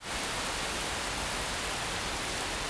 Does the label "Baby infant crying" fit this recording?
No